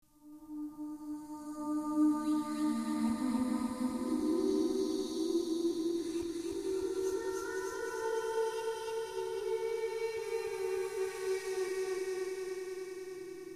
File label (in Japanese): karasu gyaku; 逆再生エコー; 原曲わからなくなってちょっと神秘的なフシギ空間; 歌; 七つの子（逆再生エコー）; ホラーボイス・声ネタ・効果音・ＢＧＭ